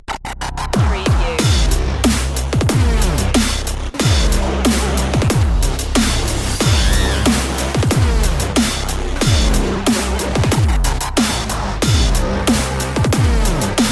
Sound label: dubstep of frying cpu